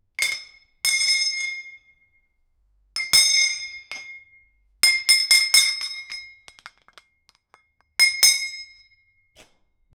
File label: barbell re rack, gym